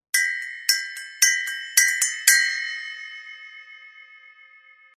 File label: 7 inch HH Radia Cup Chime; sabian